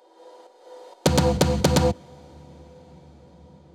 Drum Loop 128 BPM Solo Fill; VEC5 Drumloops; VEC5 Multi Loops 128BPM; VEC5 Multi Drum Loop 38 128BPM; Loop Elements

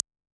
Hat Closed, 606; classic machines

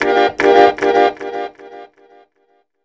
Harmonium; Sonic Foundry (Sony Creative Software); World Pop; Keyboards

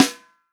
snare drum, FUNK SNARE CHROMATIC; REAL DRUMS

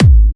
Trance Kicks, Bassdrums

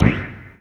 CYPUNK, DRUMS PERC; Best Service Dream Experience

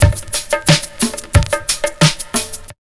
DRMS, Underground Hip Hop

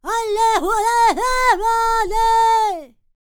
Vocal Planet World Voices, NOMADIC

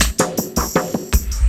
LOOP SD, 160 BPM, Drum & Bass

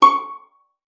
VIOLIN PIZZ C#6; Xsample VOL-5

